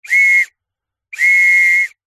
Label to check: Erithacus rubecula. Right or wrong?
Wrong